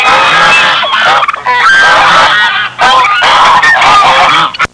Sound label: Loud geese sounds